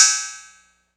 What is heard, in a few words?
big crash cymbal drum sample f sharp key, Short Reverb; Cymbal drum one shot samples